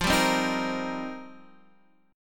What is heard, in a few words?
F 7th Suspended 2nd Suspended 4th, Guitar Open D